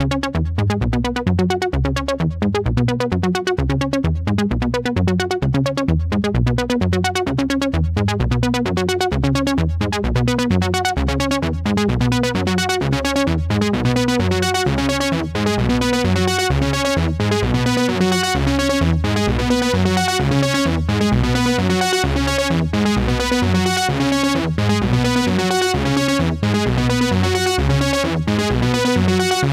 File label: NRG Sweep, Trance